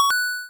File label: coin